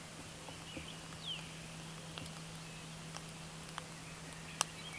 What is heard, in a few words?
Pandion haliaetus